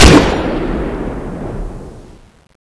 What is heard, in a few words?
mount gun shoot